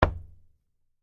Door Knock Single, Foley, Door, Knock, Single, Hard, Wood, Door; BlastWave